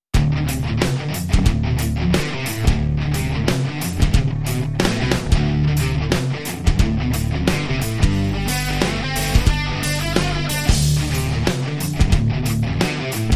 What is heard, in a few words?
Funk Metal Theme